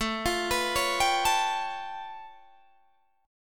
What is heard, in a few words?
A Augmented 9th A Guitar Open D